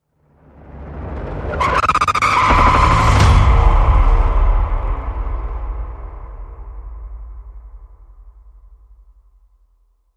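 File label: Hit Sttr, stutter hit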